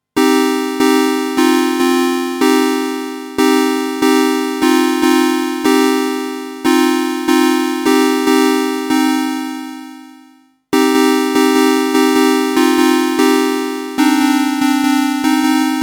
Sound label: Juno 60 Puls PWM Chords